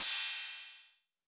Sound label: Cym B 808 Sat Decay A, Cymbal, Multi Saturated